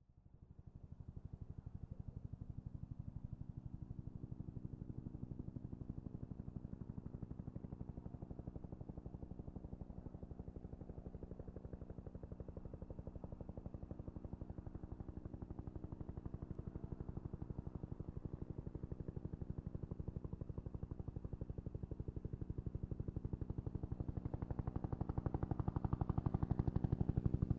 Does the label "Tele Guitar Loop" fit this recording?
No